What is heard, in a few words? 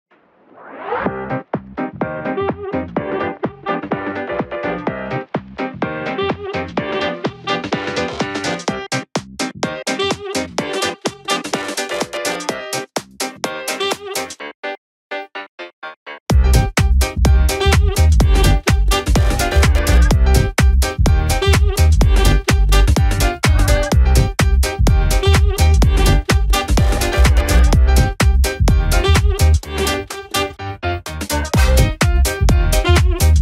electro swing house